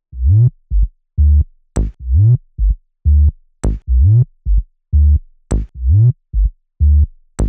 128BPM Mini Space Kit Melody

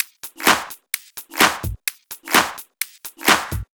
Loop, 128 BPM; VES2 Loops 128 BPM

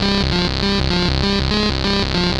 Arp C Line, ARP Odyssey; musicradar-synth-samples

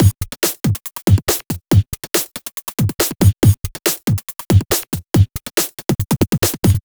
Breakbeat 140 BPM

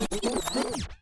back spin med, vinyl record

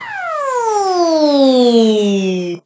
Sweeps Medium

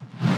BD Gate FX, Bassdrums; Vengeance Samples

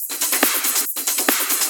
Loop 140 BPM; vengeance essential club sounds-3

